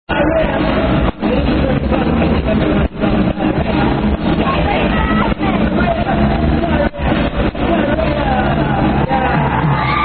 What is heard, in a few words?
Live, Diarrhoea Song